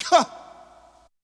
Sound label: Vocal Chant "Huh"; Ableton Live 12 Lite; Core Library